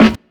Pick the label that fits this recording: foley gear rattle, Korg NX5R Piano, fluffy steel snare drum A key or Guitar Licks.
fluffy steel snare drum A key